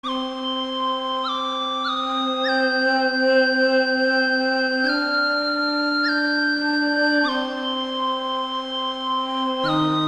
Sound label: Whistle; Valeguard